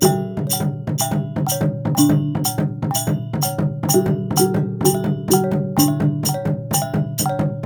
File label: WYANG L, Heart of Asia